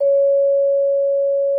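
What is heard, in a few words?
vibraphone C#5; Xsample VOL-4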